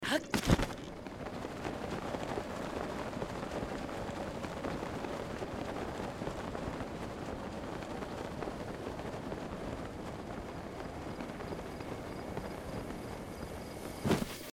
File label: flight; Zelda - Breath of the Wild; link